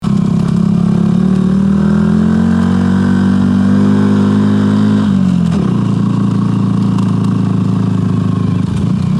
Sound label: car changing gears sound